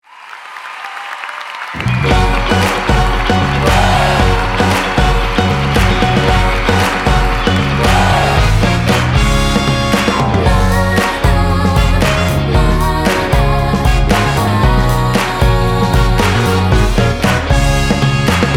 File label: BGM Musical 115